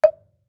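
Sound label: Marimba deadstroke fortissimo Eb4; MIS Pitches - 2014; Percussion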